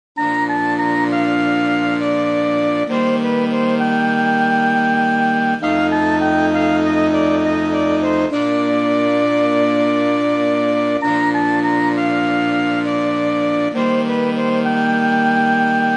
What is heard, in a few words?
beautiful dreamer sax